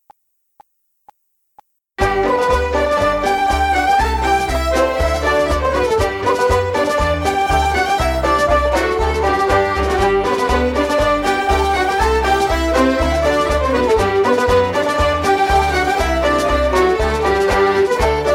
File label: Irish Ceili Polka Medley G; irish traditional